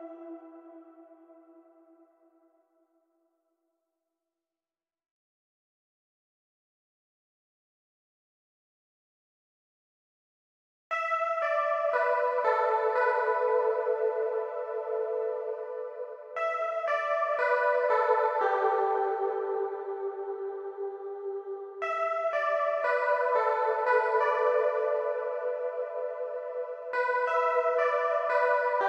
Reactor Mix Stem Layer; gravitar recharged

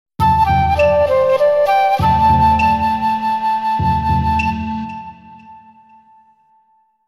arrival jingle; Kengo 3

